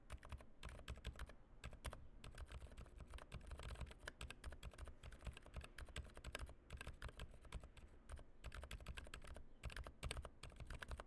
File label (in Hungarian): EF Silent Yellow, kapcsolok; avax gateron kapcsolok